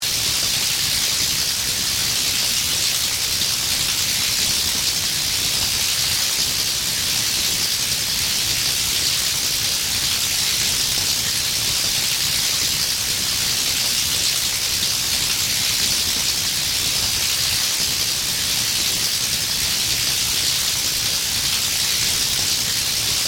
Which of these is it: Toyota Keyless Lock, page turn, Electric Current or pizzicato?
Electric Current